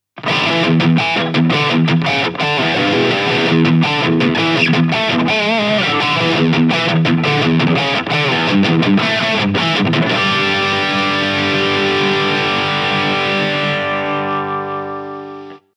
Engl R606 Ironball Head 20 SE Lead

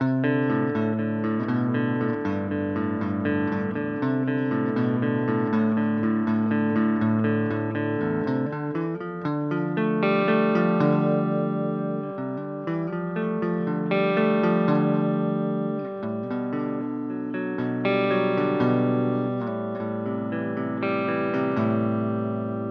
Epiphone SG Neck Clean

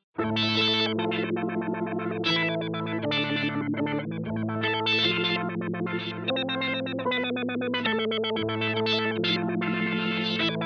MOVING FILTER

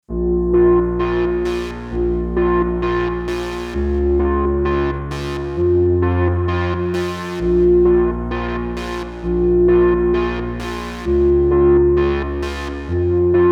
Synth warm EQ Limiter medium; SSL X-Logic Alpha Channel

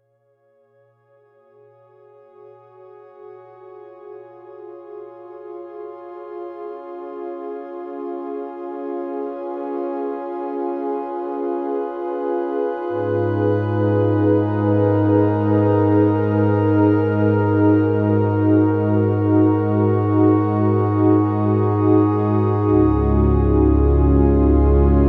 OB8 Soft Pad

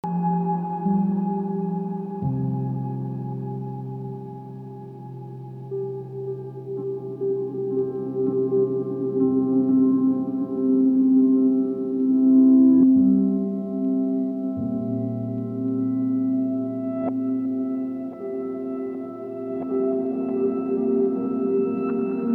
Ambient Soundscape guitar; Hologram Electronics Microcosm

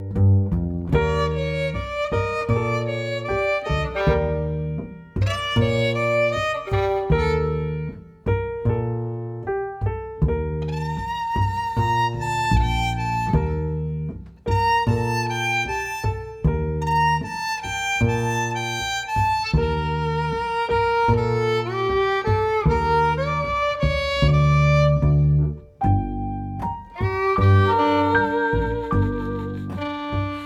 Bluesjazz Band original

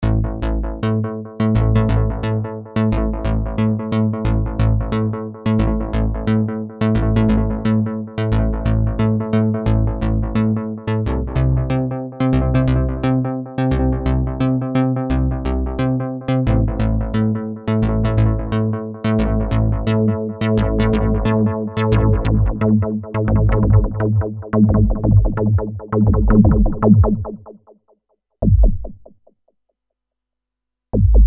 Reface CS PWM Bass

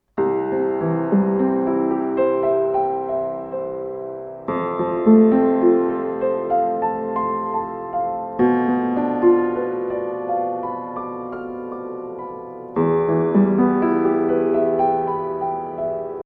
Piano MixPre 10M

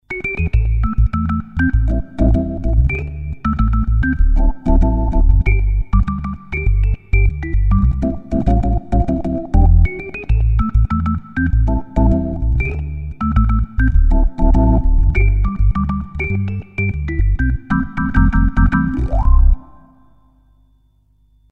Crumar Mojo Classic; Mojo Cocktail; Max Tempia

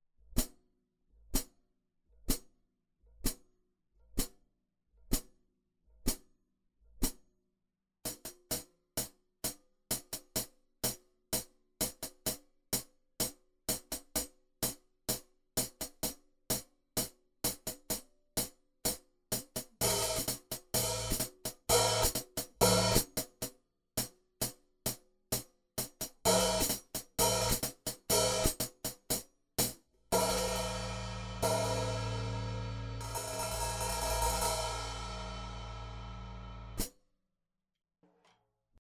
Hi Hat Oktava

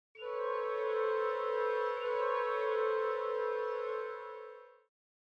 Traditional High Ensemble FX; VENTO

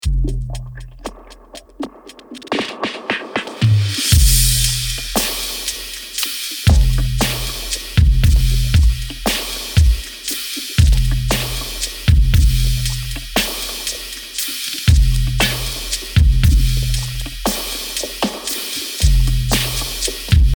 tubetech drums

